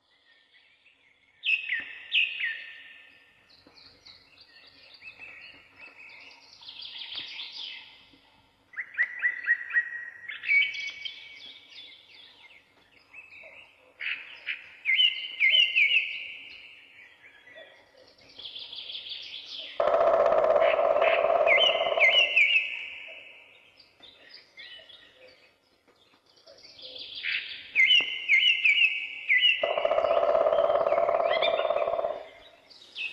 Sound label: sound forest